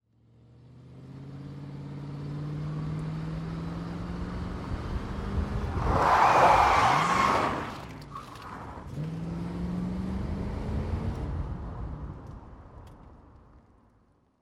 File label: Drive Spin, Car Tires; Ambient; zps